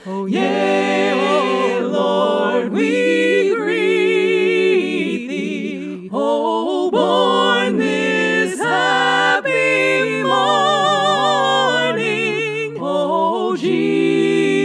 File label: O Come All Ye Faithful SATB